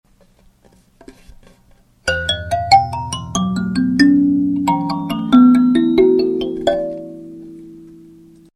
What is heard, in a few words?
kin E, Kalimba; silvermooncreations